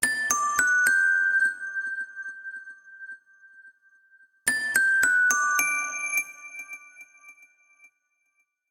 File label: ringer chimer; ringtones; Mozilla; Boot2Gecko